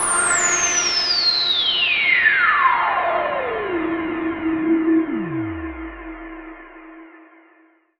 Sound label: Sweep; noise